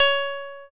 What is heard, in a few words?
guitar